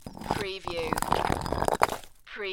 Stone Sliding Down Stone

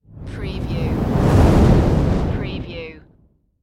Fireball Large Slow Burst

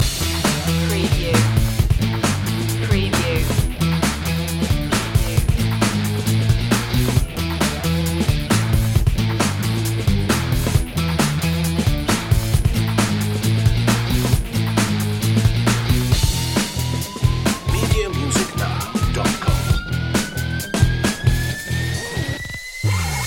burn out loop

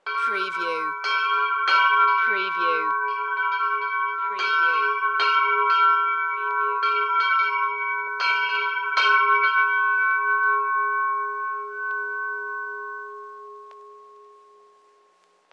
Large Handbell Vintage

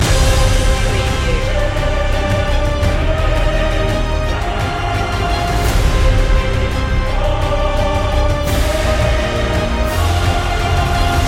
bad moon loop

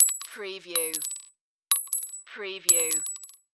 Bullet Shell 9mm Drop; sound-effects-bible

